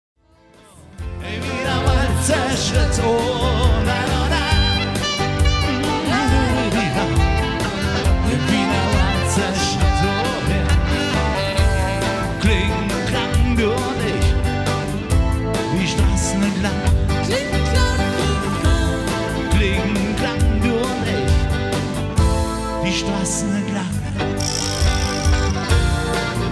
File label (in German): Klingklang